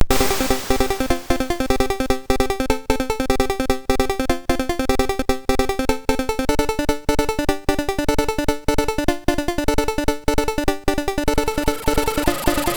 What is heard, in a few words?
Rain, MOS6581R3